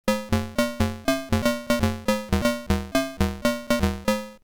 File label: fx metal drum, HardSID